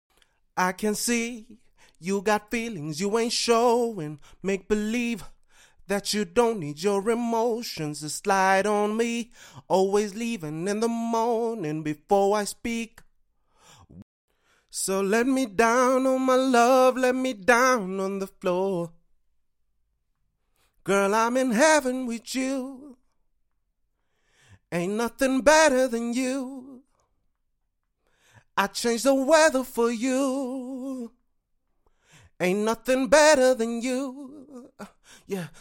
pop rnb 80 Cm

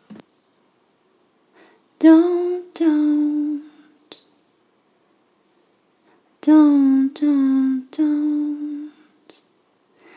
120BPM Key Dm

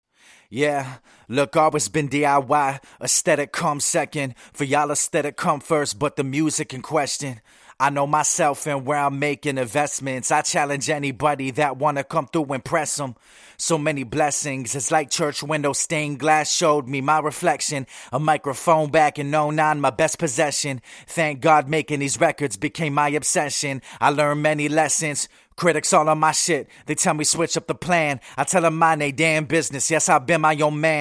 HH 90 Ebm, acapella

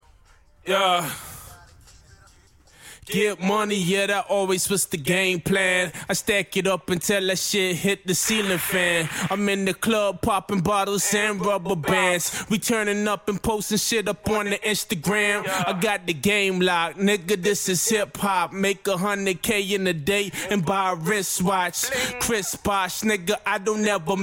hip hop 150 Amin

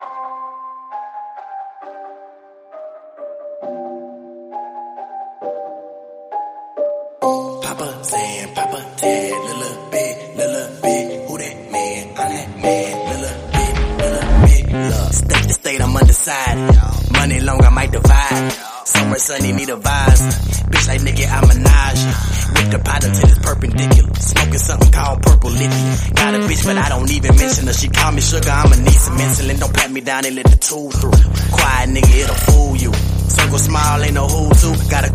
Remix, hip hop/rap

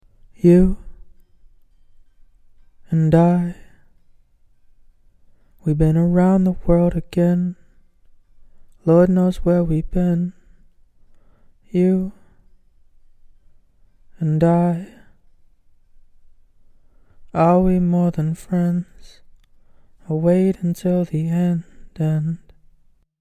Pop Hook Chorus, pop, 82, C; acapella

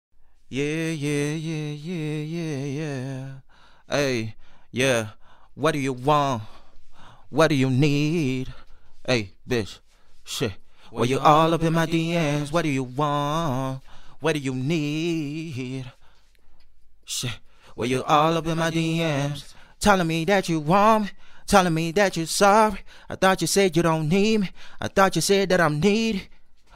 hip hop, 140, Am, acapellas

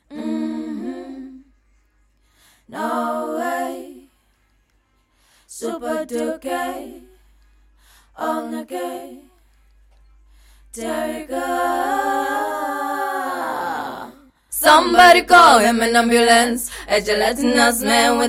female rap sing, Cm

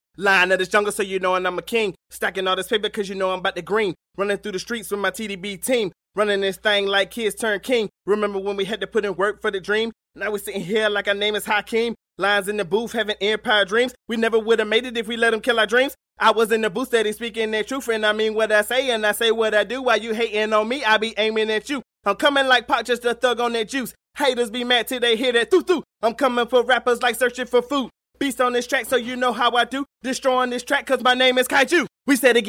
rap 120 G sharp, Acapellas

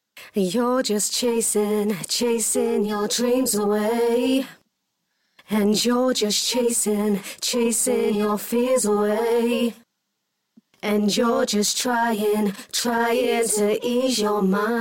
dance 90 G sharp, Acapellas

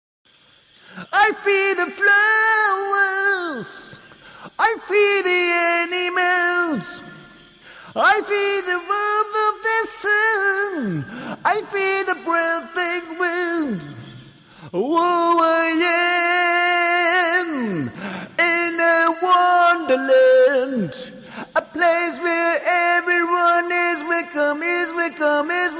rock, 140bpm, key D, Acapellas; I Am In A Wonderland

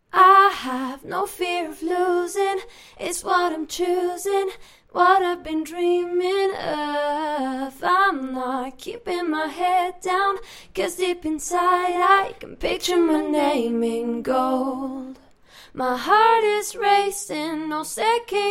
pop female, 133, Cm

